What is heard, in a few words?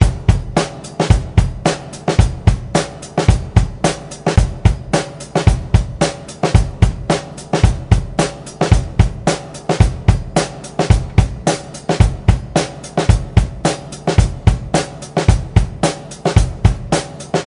Drum; MusicDelta; MDBDrumsPlusPlus